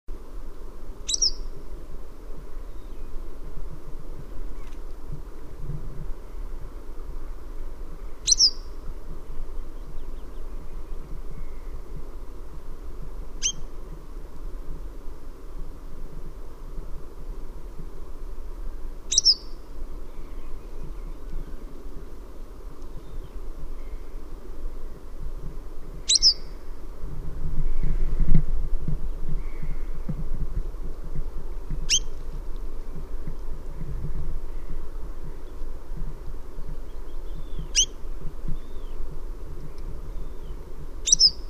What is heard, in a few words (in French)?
bergeronnette printaniere, chant